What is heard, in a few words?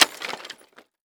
Crash Concrete Dropping On Wood And Glass Debris Short; Crashes